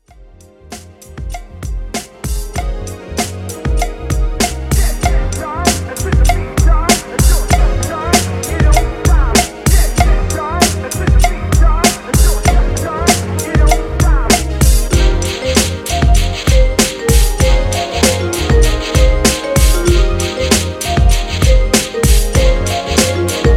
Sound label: Park Jam Remix Inst